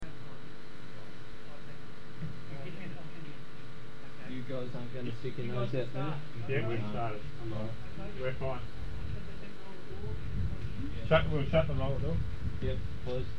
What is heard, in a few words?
Ausroc 2 Engine Firing